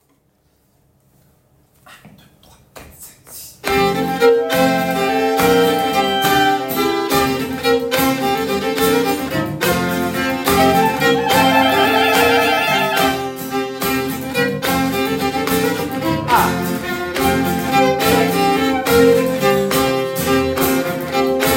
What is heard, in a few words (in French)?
Bourre e tutti